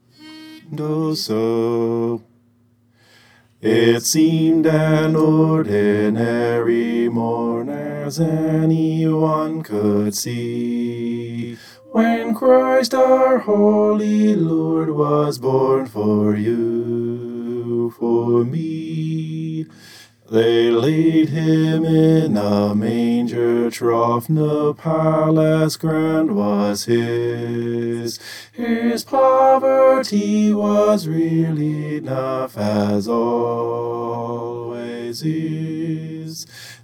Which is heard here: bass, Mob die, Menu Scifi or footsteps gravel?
bass